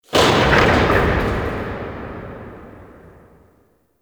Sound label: Gas City Distant Metal Latch Huge; Warframe; Corpus Gas City; Ambience